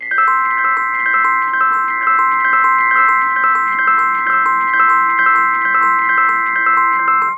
rhodes loop flutter high 130 Cmaj

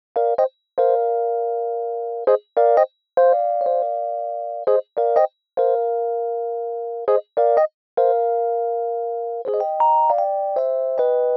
rhodes

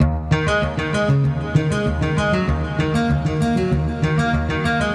Guitar

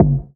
percussion

